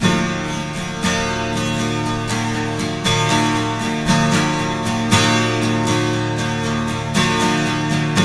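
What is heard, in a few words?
12 string minor E2, guitars; Spectrasonics; Hans Zimmer Guitars VOL-1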